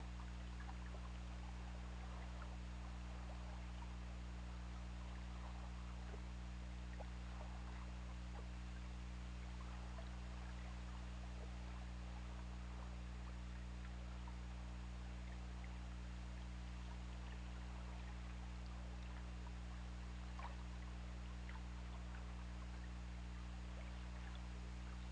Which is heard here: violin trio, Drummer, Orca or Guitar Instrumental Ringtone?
Orca